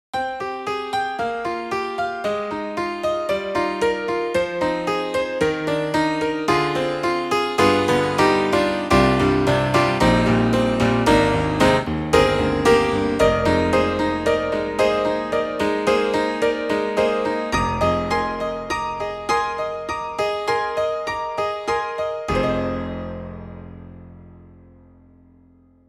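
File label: ragtime, piano; IK Multimedia; Sonik Synth 2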